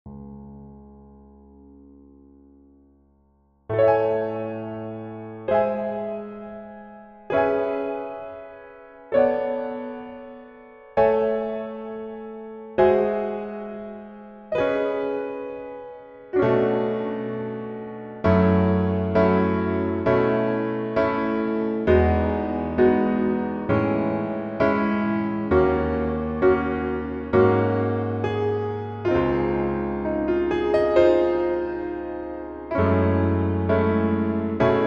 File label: Piano